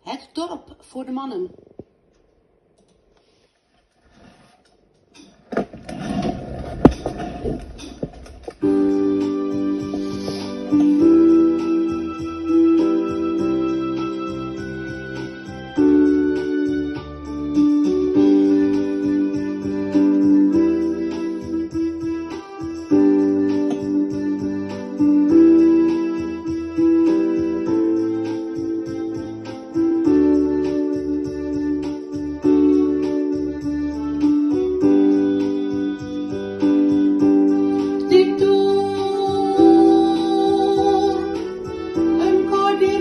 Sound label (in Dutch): bas